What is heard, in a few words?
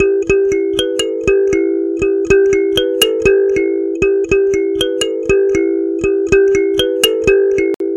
Thumb Piano - More Treble; Shinechilla